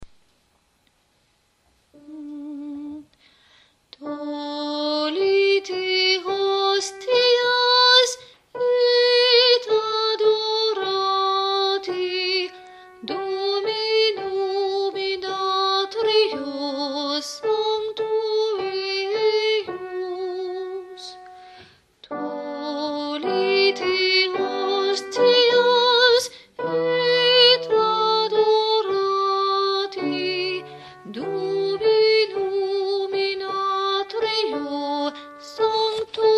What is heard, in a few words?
tollite hostias, alto